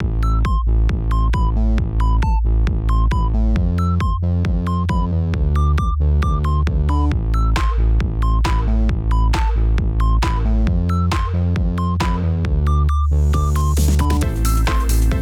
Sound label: Buzzy Woods loop